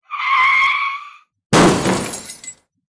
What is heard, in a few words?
Car Crash